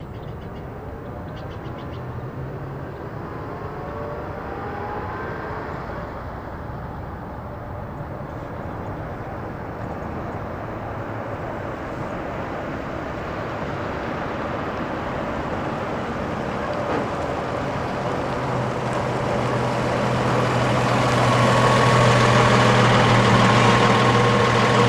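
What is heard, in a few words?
Chevy Truck 46 Stop Idle Off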